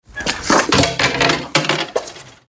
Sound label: osaisen